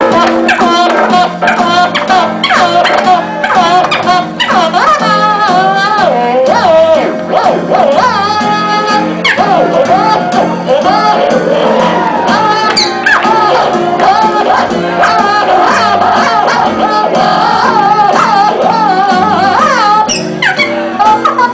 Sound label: Trumpet Thing